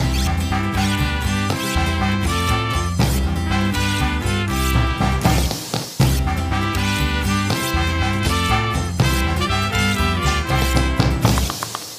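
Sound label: food world music inferno no vocals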